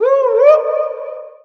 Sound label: "woowah"; ooh ahh; ho hoo woo